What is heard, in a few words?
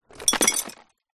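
broken glass pieces small bottle movement tip; foley; zapsplat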